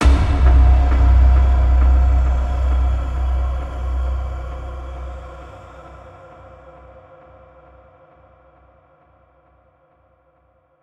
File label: impact